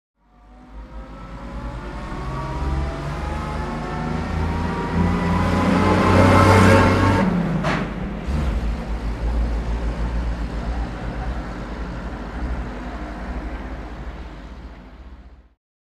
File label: Automobile; By; Austin Truck Up And Past At 20 Mph.